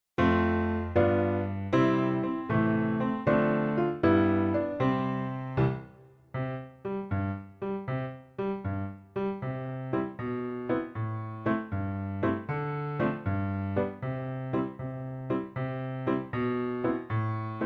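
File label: instrumental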